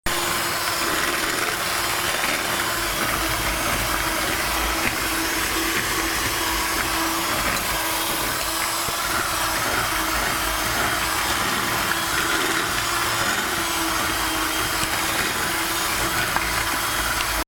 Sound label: Kitchen Mixer, Kitchen Blender